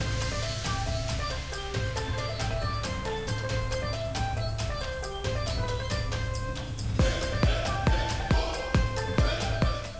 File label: bgm